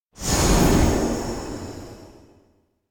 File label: elec exit corridor; spica; anemoi